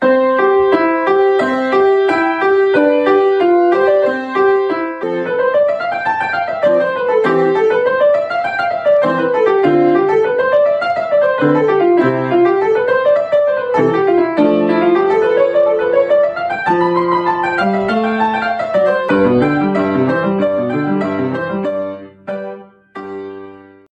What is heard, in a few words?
piano